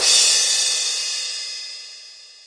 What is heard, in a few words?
sparkling crash